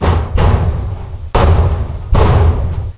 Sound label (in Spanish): golpes